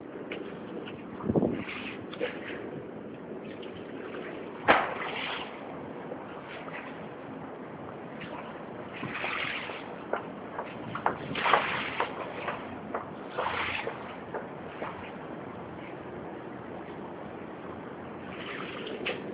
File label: Thames sound of the water